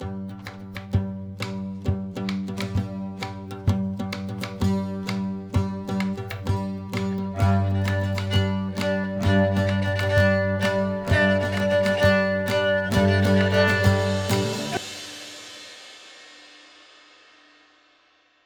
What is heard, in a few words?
Tavern Intro; The Witcher 3 Wild Hunt; Skellige